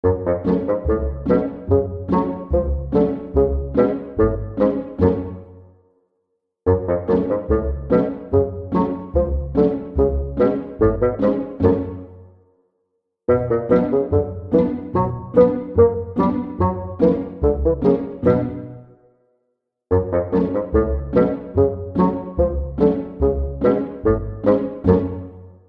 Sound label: funny bassoon